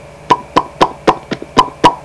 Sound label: pop, People; Sound Library 2000; Wayzata Technology